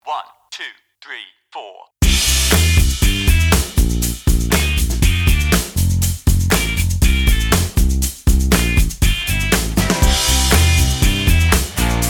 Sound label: Positive Daytime Sampler